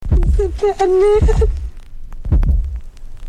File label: Exclamation; Desktop Themes; Texas Chainsaw massacre